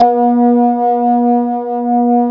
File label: ESQ 1 E-BA, BASS; Soli-Music; World of Synthesizers VOL-1